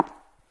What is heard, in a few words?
tile step; Golden Crayon Sounds